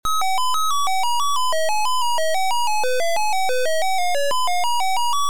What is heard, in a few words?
MELODY